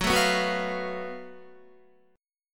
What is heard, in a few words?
F sharp Minor Major 7th Double Flat 5th, Guitar; strummed